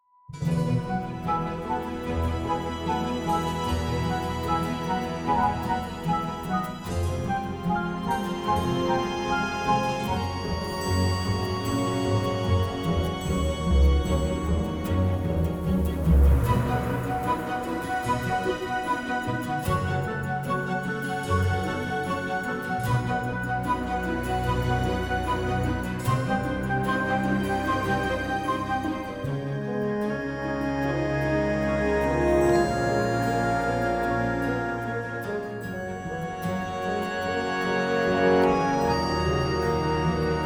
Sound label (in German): Osterraeder mit Gitarrenbegleitung